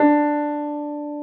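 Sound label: piano D4, soft piano; AKAI S-Series CD-ROM Sound Library VOL-2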